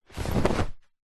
foley clothing suit trousers pants pick up

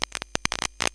glitched tones AM Radio Interference

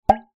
tin can with water in hit; foley

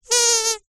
leisure toy squeaky squeeze weak

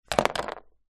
leisure toy plastic figure place down on table; zapsplat

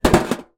small metal case tin down on ground; foley; zapsplat

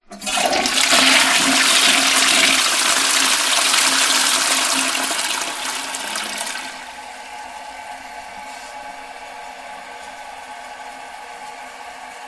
household toilet flush modern

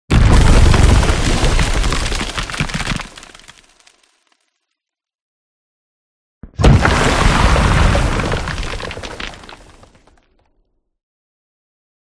CINEMATIC IMPACT Eruption; smartsound

